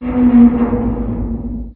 Crunch

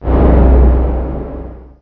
Collision Ext

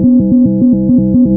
Config Switch

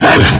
All Projectile

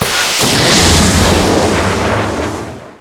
Booster Ext

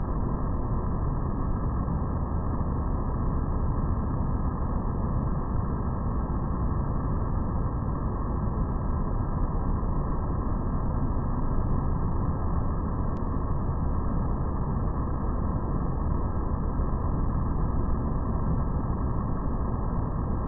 Pipe Machine

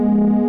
Refinery

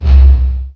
Collision

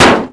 All Explosion

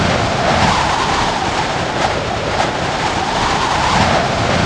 HVAC

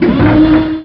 Myomer Servo

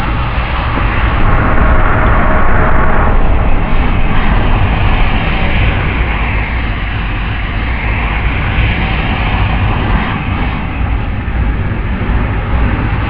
Refinery

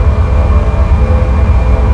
Engine Power

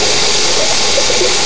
Coolant Dump

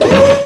Myomer Servo

